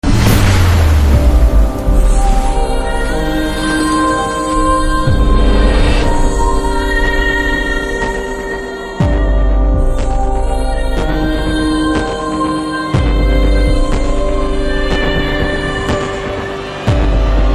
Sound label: Tamil BGM Ringtone; Saaho